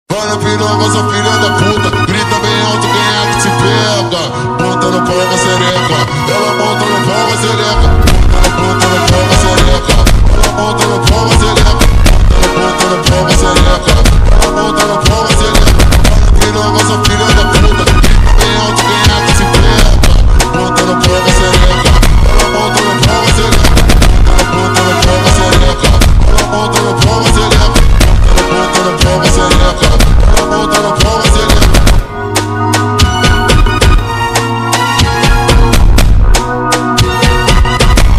Brazilian Funk Remix

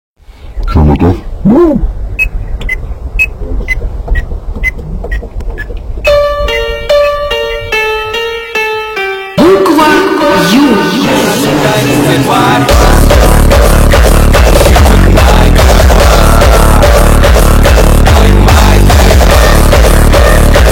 hardstyle remix

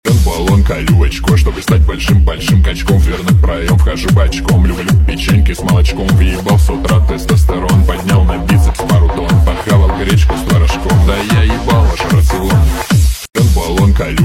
Hardbass MashUp Remix

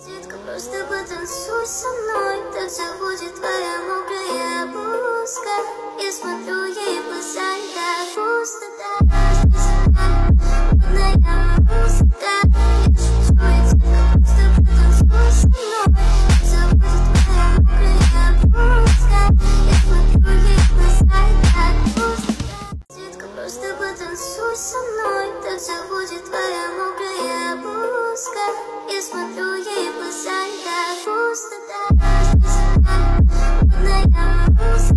Phonk Remix

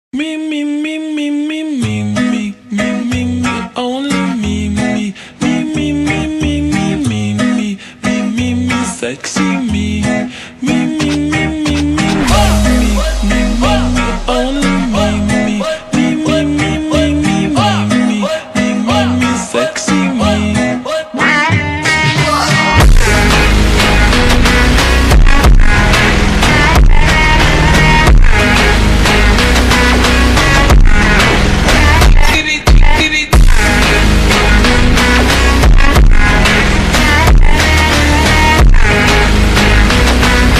Super Slowed

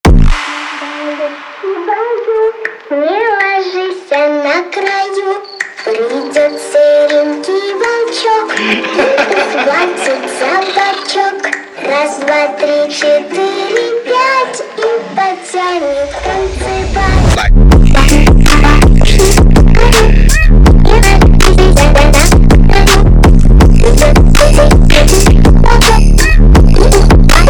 Phonk

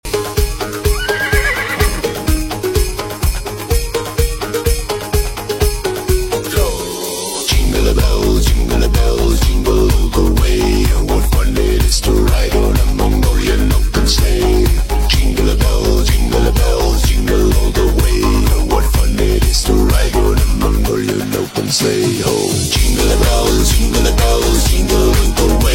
Jingle Bells Mongolian Style

